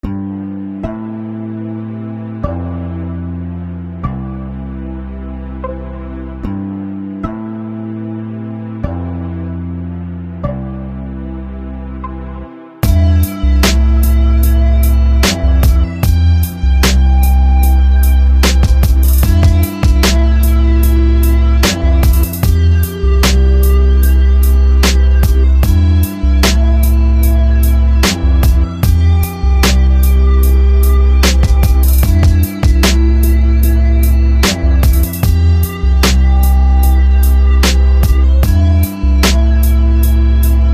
only beat

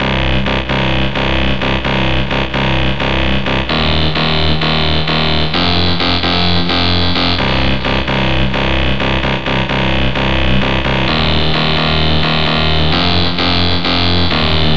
bass

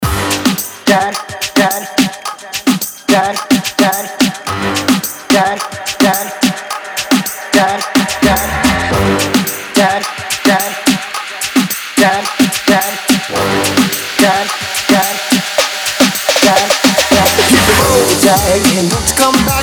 Moombahton

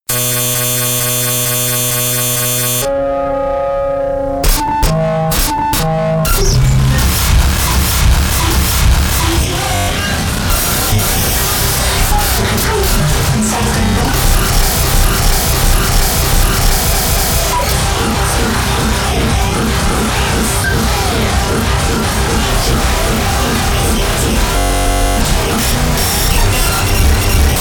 Digital Noise Version

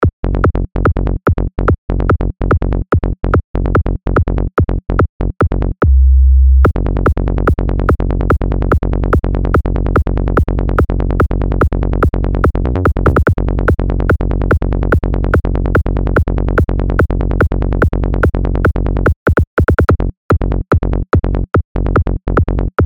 Psy Trance Kick Generator; Soulbringer

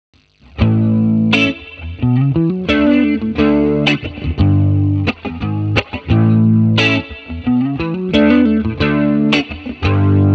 funk A 88bpm

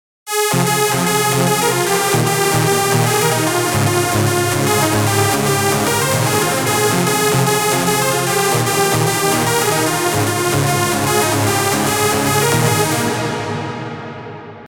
Lead Hardstyle Sample

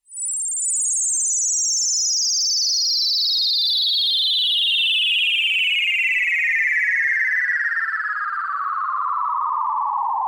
Yamaha SY99 SFX

